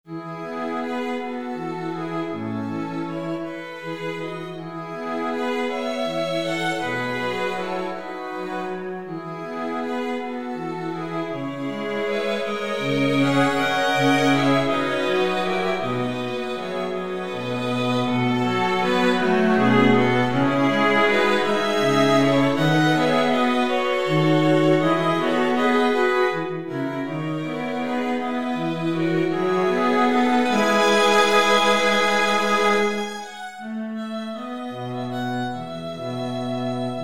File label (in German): streichquintett